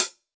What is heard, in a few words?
perfect foot cymbal d sharp; hat samples